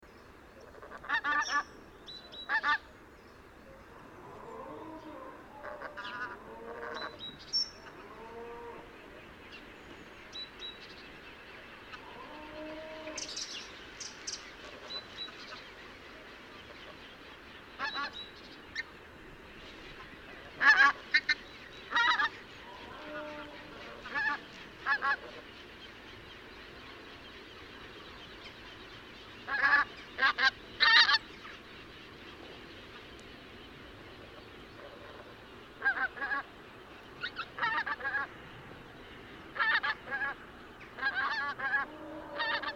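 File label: pink-footed goose chuck honk squeal; caerlaverock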